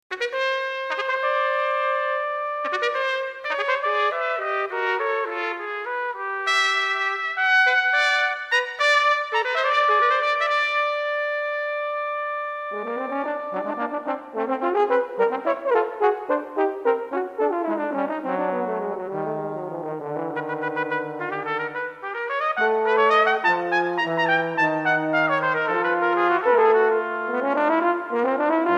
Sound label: Brass Quartet Andant